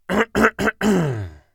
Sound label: Hawk Hew